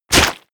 hit blood heavy

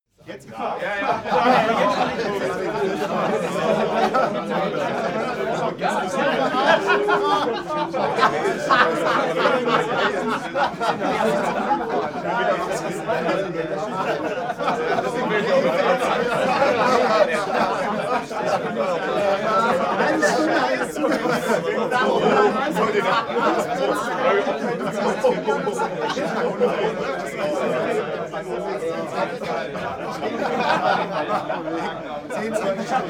male crowd happy

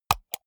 computer mouse wheel click hard

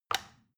plastic button tiny release regular

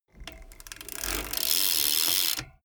bmx bike pedal once freewheel brake short

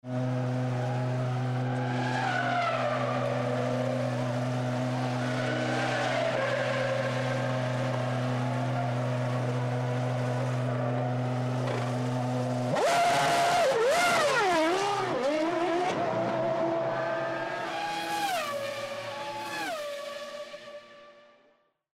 f1 race pit stop

